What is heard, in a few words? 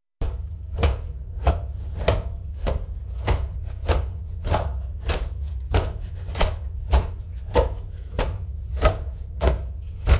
Chopping tomatos on a wooden table